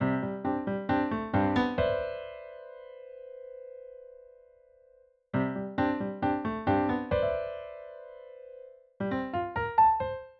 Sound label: female audition accompaniment